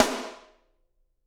SD, Drums; Bob Clearmountain Drums I

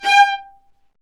Vas1 Marcato

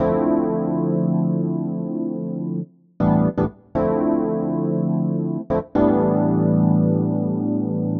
Chord El Piano Am, 120bpm; Zero-G; Extra Chord Progs

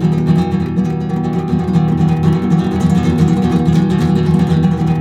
F M, Guitars; Spectrasonics; Hans Zimmer Guitars VOL-2; FLAM TREM CH

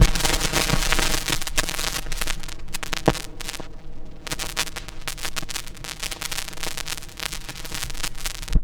FX SWEEP

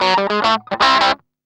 GUI, licks; 110 LICKS

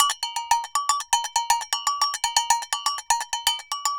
AGOGO, DRUMS SAMBA; Best Service ProSamples vol.14; AKAI

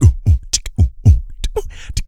BOOGALIPS, Vocal Blues Soul; Spectrasonics

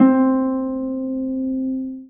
STR HARP, STR Orchestral

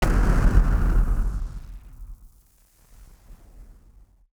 Party Popper FX

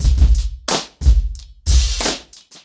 90BPM, acoustic drums; The Roots Drumloops

